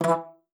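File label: E3, bass flute; Xsample VOL-2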